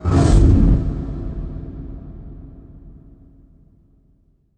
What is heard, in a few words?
impact flame, Cinematic Impact